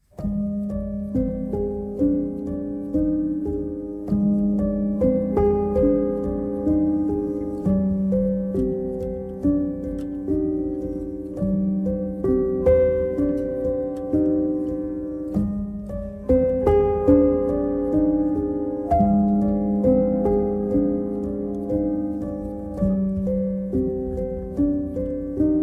Piano Version

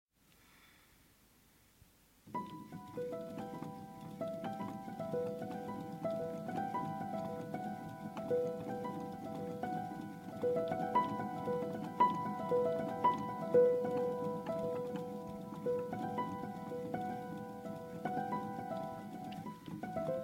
Trance Fast

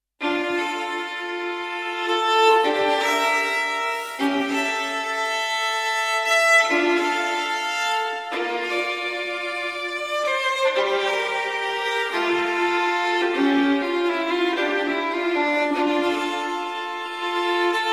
Partita in D Minor for Solo Violin; Partita No. 2; BWV 1004

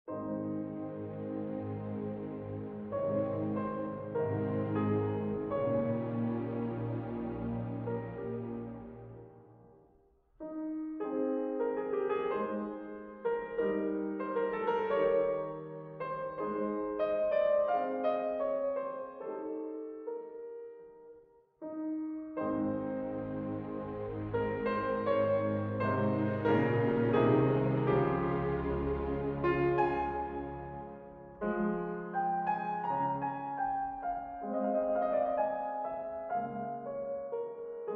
Piano Concerto in C Major, Largo

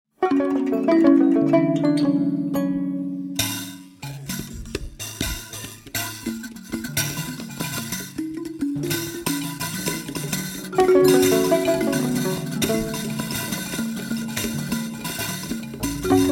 Ocean Calimba

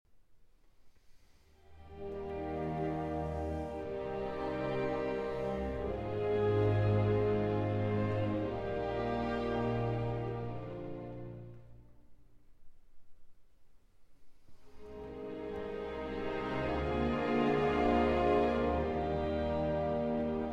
Piano Concerto in B Flat Major, Adagio

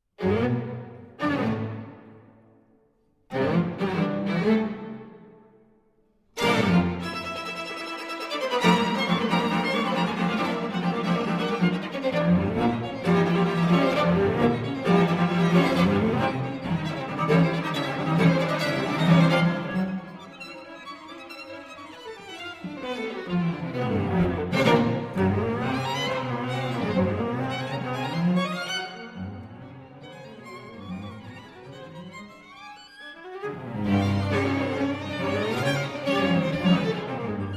String Quartet in B-Flat Finale (Allegro vivace)

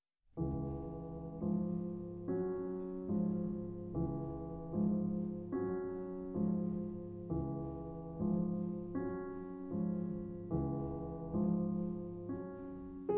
Preludes, Andante con moto e poco rubato; Gershwin Three Preludes

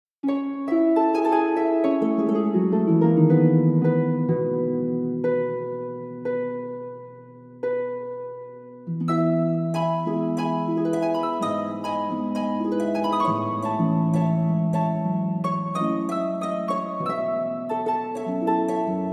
Waltz, Harp

